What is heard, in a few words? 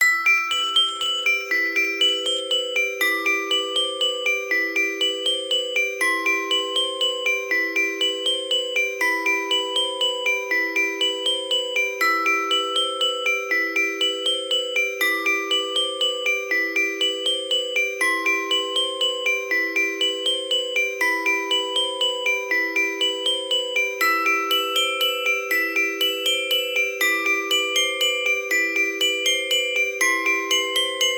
cave in game